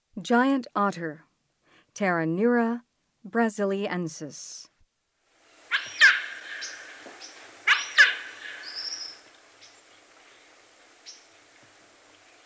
Giant Otter, Mammals of the Rainforest; S. America

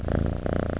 CAT, animal; 动物

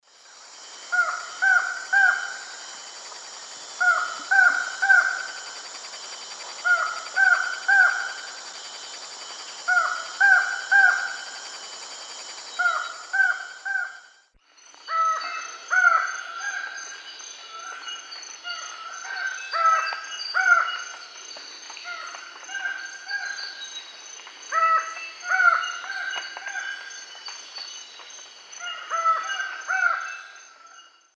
Micrastur gilvicollis, calls 2 birds; S. America; Eastern Lowlands

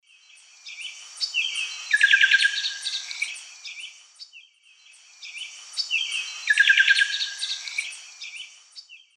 Cacicus solitarius, calls; S. America; Eastern Lowlands

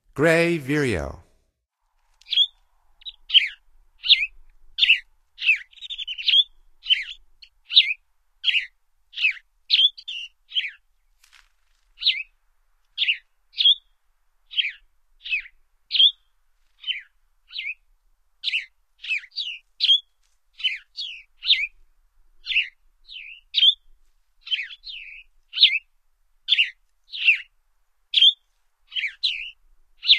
Gray Vireo, Songs of Birds of North America; Stokes

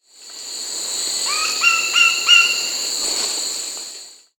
Chestnut Woodpecker (Celeus elegans), Peru; S. America

